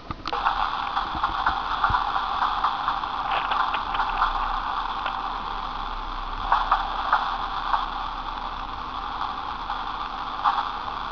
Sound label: firework